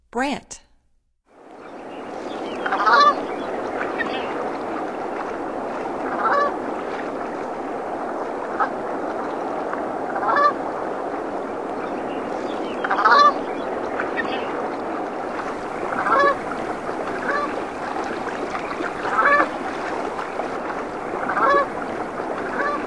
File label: Brant; North America; Stokes